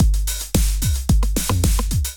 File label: 909 Kit groove 110 bpm, Drum; MIDI Clips; Flavour; Ableton; Drum Essentials